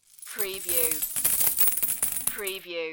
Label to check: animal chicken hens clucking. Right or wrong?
Wrong